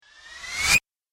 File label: Whoosh Sharp Vacuum; Sound Design; Whoosh Sound Effects